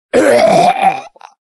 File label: death